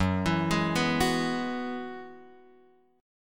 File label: Gb 9th Suspended 4th Gb down Guitar Open F, chord; Gb9sus4; arpeggiated; JGuitar